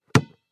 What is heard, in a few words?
Basketball bounces once hard surface